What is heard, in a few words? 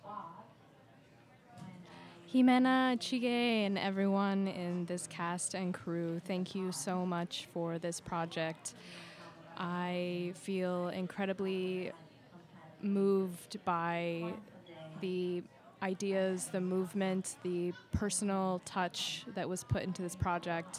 Audience Recording; a-meal-premiere